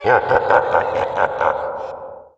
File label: haha; predator